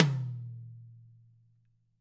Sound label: DW hit, Drums; DW+CAMBRUSH; ILIO; Double Platinum Drums 1